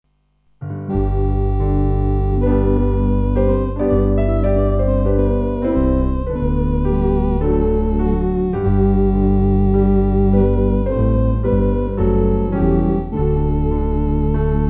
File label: O for a thousand tongues, Piano Organ